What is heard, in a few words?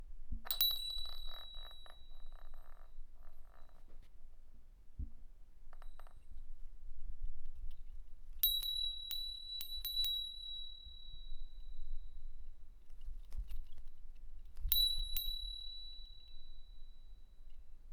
Small Bell Ringing